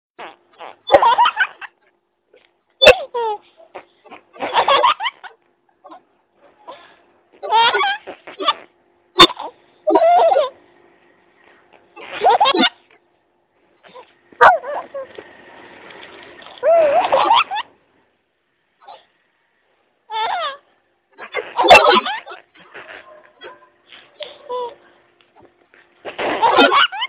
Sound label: princess laugh; children